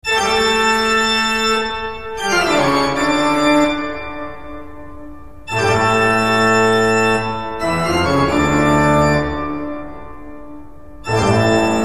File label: Organ; Castlevania